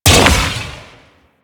Explosive Impact; Weapons; Warframe; Sapient; Sapient Primary

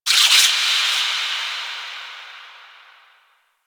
Ocean Underwater Critter Small

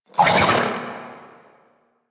Vocal Pain; Warframe; Sentients; Conculyst

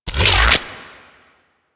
Shotgun Beam Retract; Weapons; Revenant; Warframe; Tenno

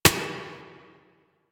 Turret Low Clip; Lasrian APC

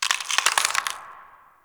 Cave Root Creak, Ambience; One Offs; Neathuns Root Cave

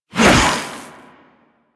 Briefcase Katana Whoosh C; Crp Briefcase Katana

